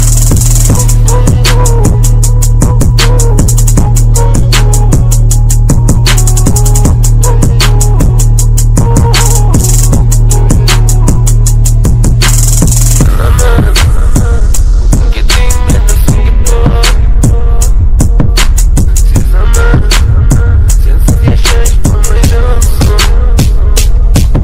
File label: cool english ringtone